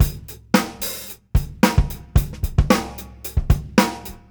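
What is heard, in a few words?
Beat Loops, 111 bpm; Zero-G - Monster Beats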